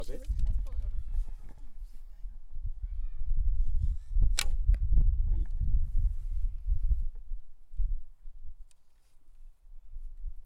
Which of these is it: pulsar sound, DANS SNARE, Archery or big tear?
Archery